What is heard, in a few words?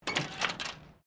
Door Pivot Exterior Aluminium Flyscreen Open; Various doors; Miscellaneous Doors